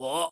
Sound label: Outro; eldorado; gonzo